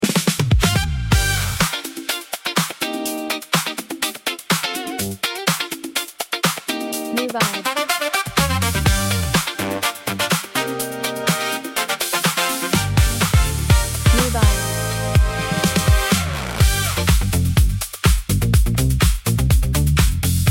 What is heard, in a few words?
Disco Funk Attack